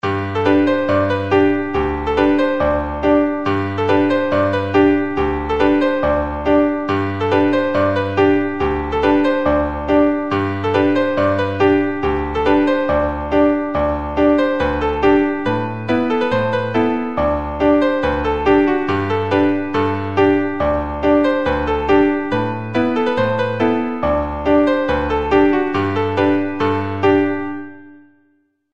laride; baud

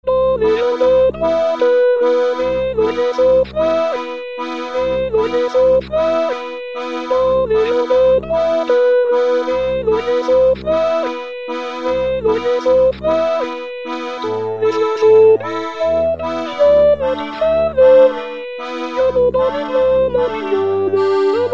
hanter-dro

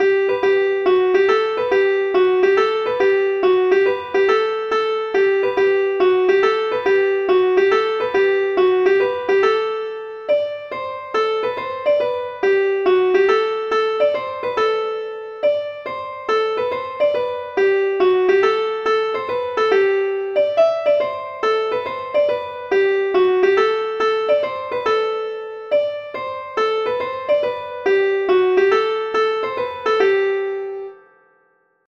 mazurka

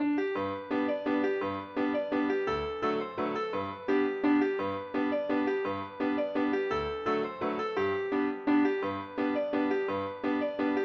mazurka

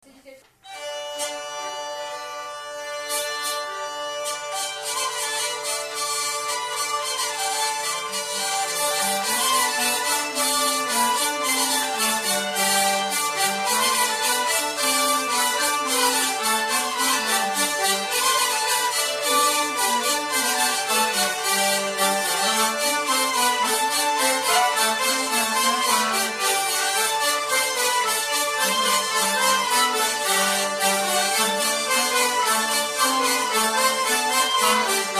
avant deux polka

